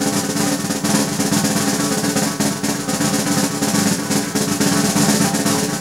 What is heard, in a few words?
PERC FX, Dance Drums